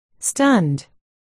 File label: stunned